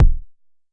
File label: Jomox MBase, drum machine; Jomox MBase 01